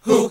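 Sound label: Gospel; Best Service Gigapack 1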